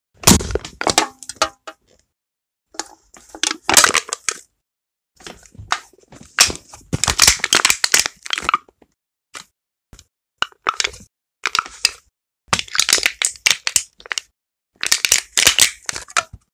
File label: Crushing a soda can; مچاله کردن قوطی نوشابه